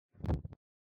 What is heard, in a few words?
Lighter Flame